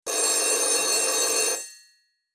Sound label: french phone; French Rotary Phone